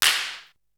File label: Clap, Drums; ZERO G - Datafile DISC 3